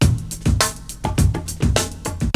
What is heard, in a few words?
LITE FUNK, BREAKBEATS; Crypton - DrumBase5000